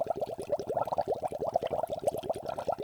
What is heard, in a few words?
Alchemy Bubbles; MAD Alchemist Lab